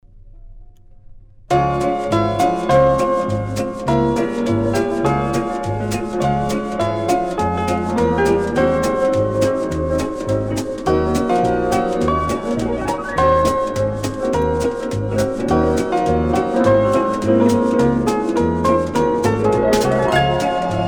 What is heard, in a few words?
piano